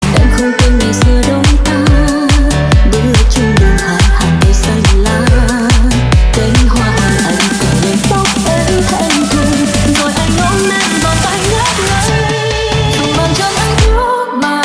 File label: remix